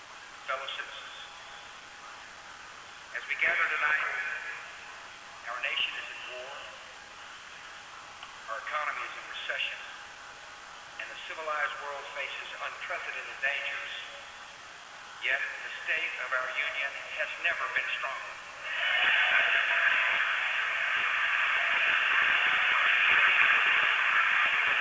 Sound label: without echo